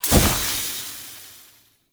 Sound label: Magic Spore Puff, Root Cave; Ambience; Props; Neathuns Root Cave